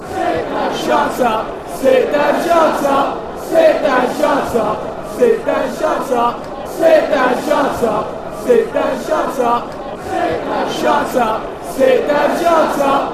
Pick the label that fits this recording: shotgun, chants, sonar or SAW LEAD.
chants